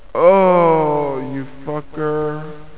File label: die; Duke Screw'em 38D